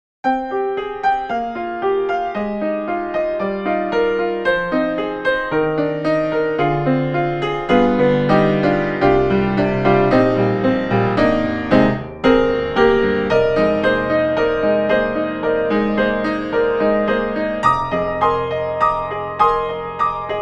Virtual Grand Piano Vintage Jazz; Art Vista; Virtual Grand Piano 3

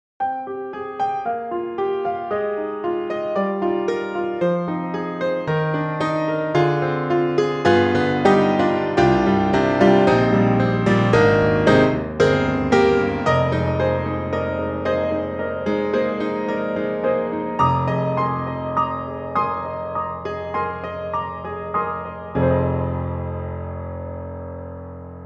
Pop Piano; BEST SERVICE; COMPLETE ORCHESTRAL COLLECTION

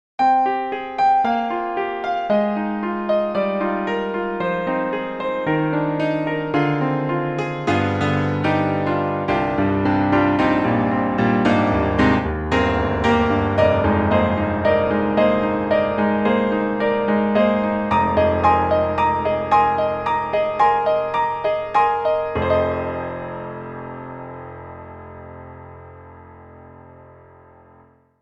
Roland Juno-Gi 88 Key Grand

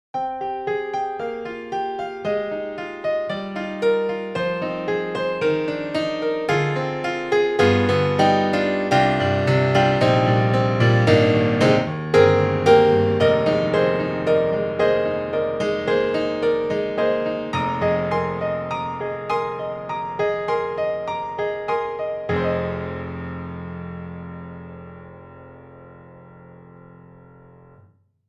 Grand Pianos, Studio 7ft, Rock Shift C7; Synthogy; IvoryII

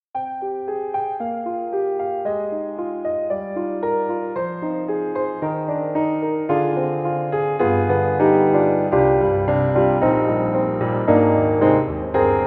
Mini Grand, Light Ambience; AIR Music Technology

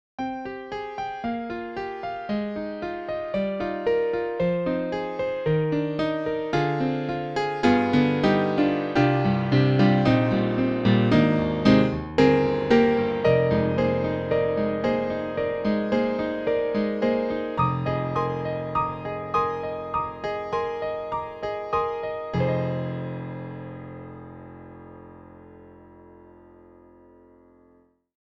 Piano 2; Native Instruments; BANDSTAND

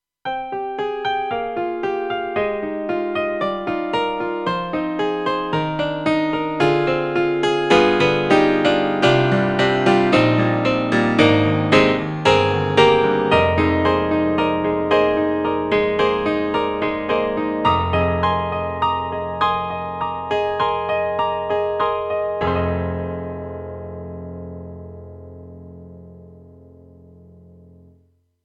KORG X3R BritePiano

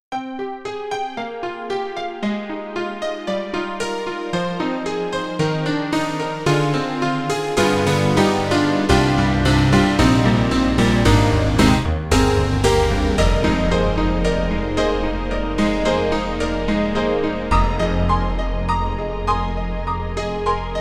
KORG KRONOS 2 Power Piano

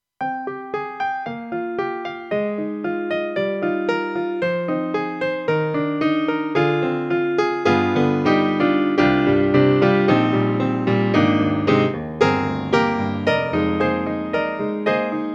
AKAI SG01p, SOFT GRAND

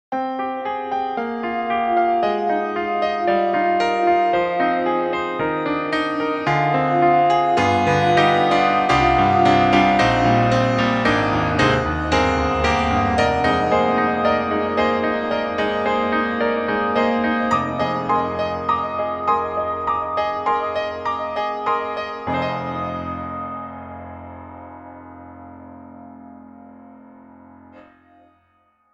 White Grand Overtone Ring

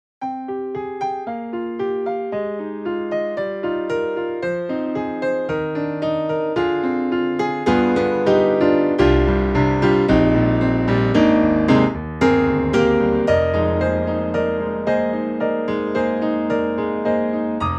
GRAND PIANO Vienna Grand; BEST SERVICE; GALAXY II GRAND PIANO

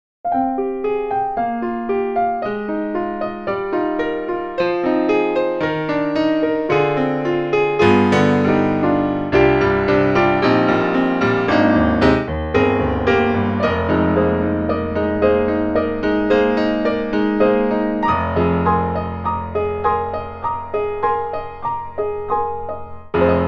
AKAI Splendid Grand (Off Mic); Emulator X

